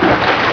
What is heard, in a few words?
water chop; hl2; nature